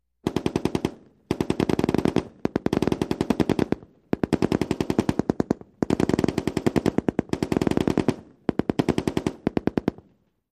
M60 Three Firing Simu

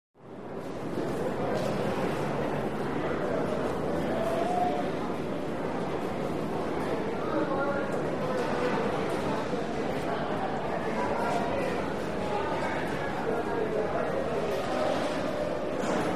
Busy Subway Platform

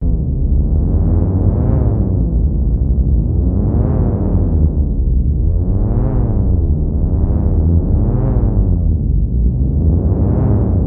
Oberheim Matrix Atm, sci fi ambience

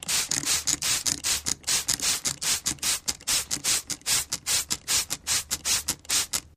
Spray Bottle Pump, bathroom fx

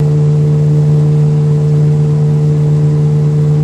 9.9 Evinrude Boat Drive Loop High Speed, On Board

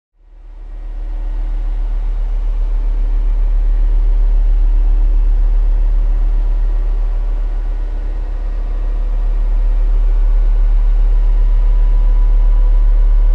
Bed Airy Noise Lfe

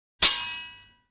Single Sword Hit

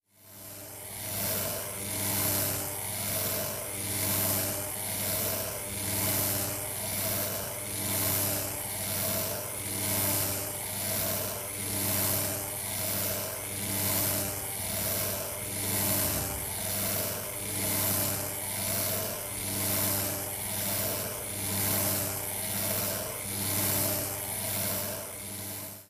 Small Electric Motor

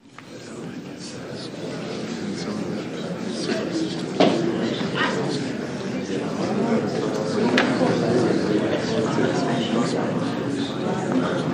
Court Murmuring Whispering

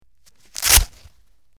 Velcro Rips Close Perspective

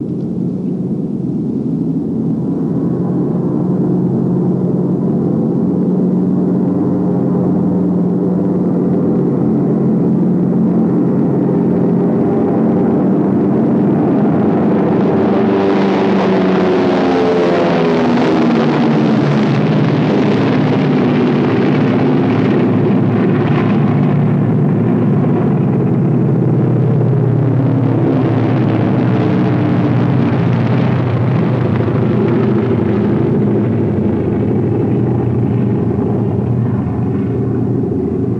B29 By X2 Planes Fst By, b-29 superfortress; B-29 By, x2 Planes Fast By, Offset

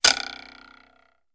Arrow Hits Arrow Hit

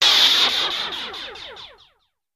Quick High Pitched, zaps

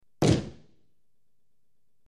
Gavel Impacts Dull

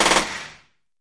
Machine Gun Single Rapid Fire Burst

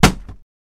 Telephone Book Fall

